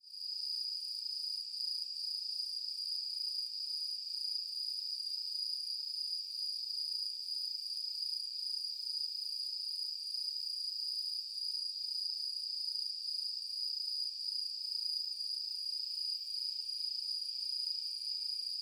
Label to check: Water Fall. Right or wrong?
Wrong